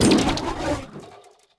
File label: suspension cables break